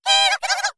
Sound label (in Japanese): warai